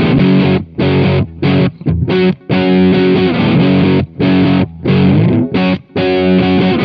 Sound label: guitar, Loops, Phonky